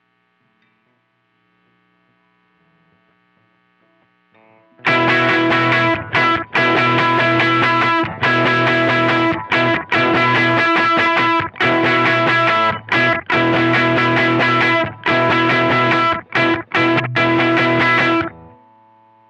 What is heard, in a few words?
Riff Slow